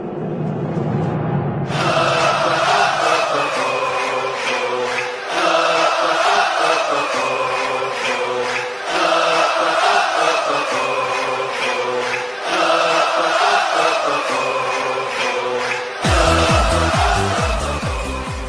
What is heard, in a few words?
goal song; sl benfica